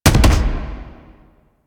Rocket Launcher Punch; Weapons; DE; Warframe; Tenno; Lasrian

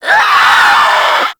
Infested Crawler Attack Short; Warframe

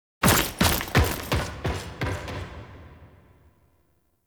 Forest Thumper Exit Foley; Warframe; Veilbreaker; Kahl

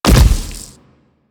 Splitter Land Default, Footsteps; Warframe; Infested; Grey Strain

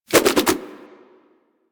Nunchuck Spin Whoosh G, Infested, Weapons; Warframe

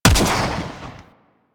Swarm Missile Shot; Enemies; Warframe; Eidolon; Jetpack Marine

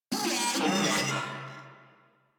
Vocal Enemy Spotted, Enemies; Warframe; Moa

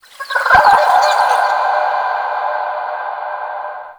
Small Creature; Ambience; Dungeons; Under City

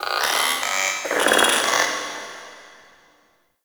Robotic Chatter

